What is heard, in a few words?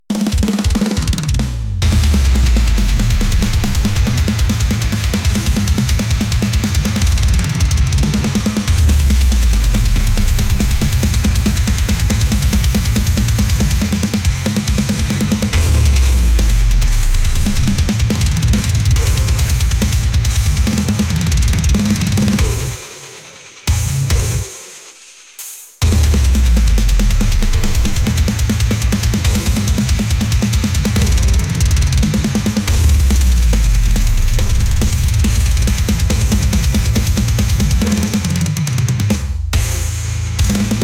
brostep banger bass